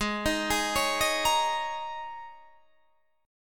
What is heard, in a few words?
G sharp Suspended 2nd Suspended 4th G sharp Guitar Standard; arpeggiated; 16,18,18,18,16,18